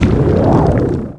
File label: magic